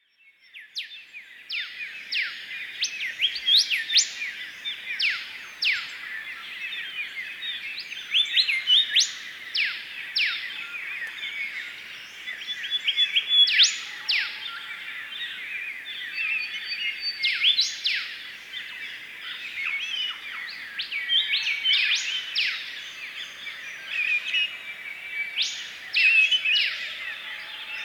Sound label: Waratah Flat dawn soundscape